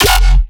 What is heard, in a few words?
bass hit Schwomp F; Virtual Riot